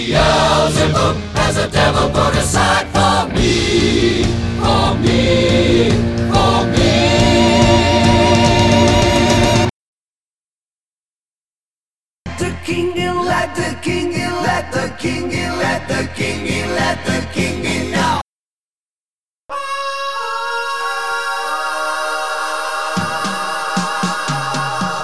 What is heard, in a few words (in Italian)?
armonizzazioni